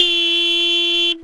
horn, synths; Notes

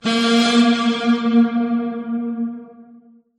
wicked guitar; Notes

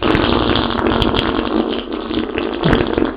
fart long

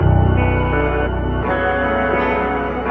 guitar utterly bizarre; Loops; Sad

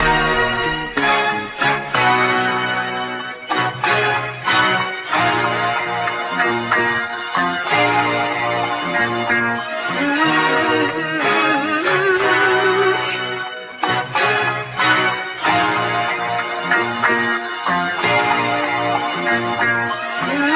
horns, Loops, Phonky

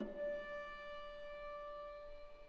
violin; String

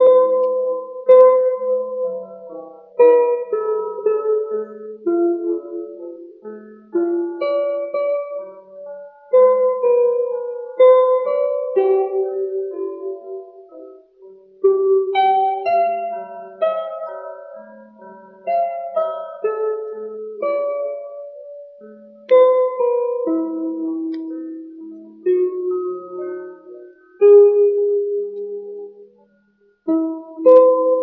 piano, sad loop

